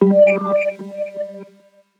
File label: synth note particle G; Virtual Riot; Synth Notes & Chords